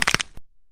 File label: bone break